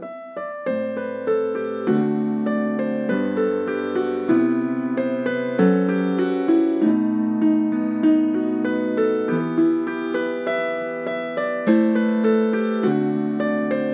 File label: Mini Piano